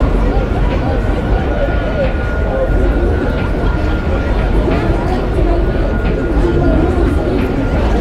VIP crowd

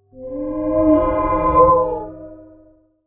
Fly By Gong

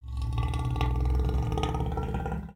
Pets Lion Idle Med; creatures; Lego

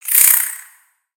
Spider Exert; creatures mechanical; Lego

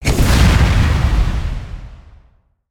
Fire Burst One shot

